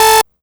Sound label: Synth Hit A; Zero-G Trance Inducer; 90 s Sample CDs